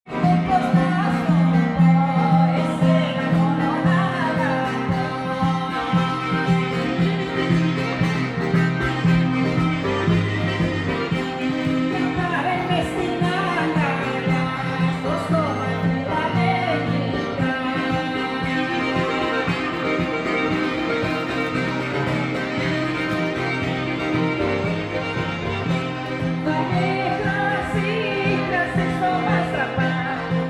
Athens Sunday Park Concert; balkan, folk, greek, music, sing, traditional

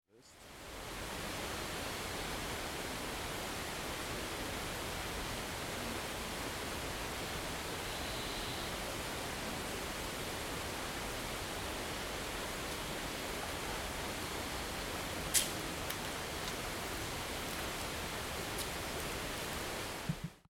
Josephine Rainforest

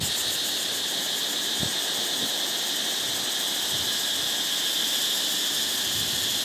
Cat Tien Cicadas in the national park

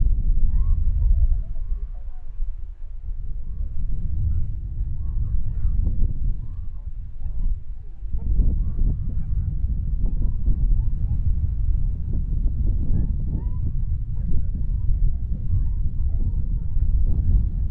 Seals on far beach

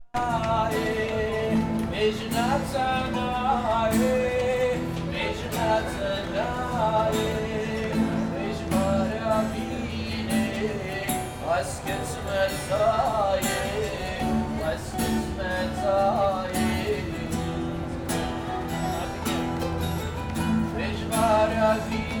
Istanbul Galata Buskers, field recordings

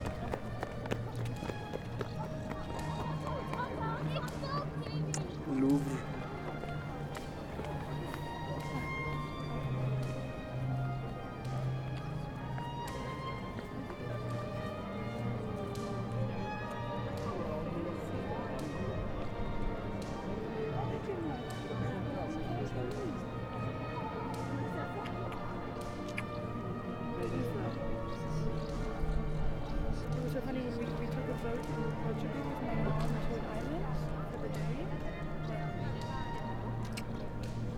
Louvre

